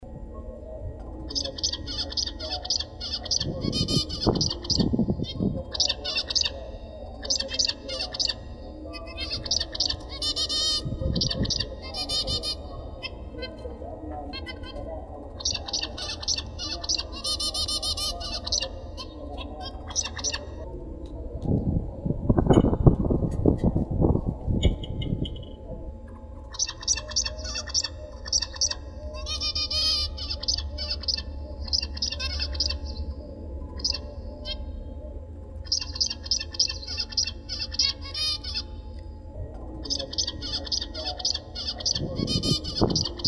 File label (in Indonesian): audio masteran zebra finch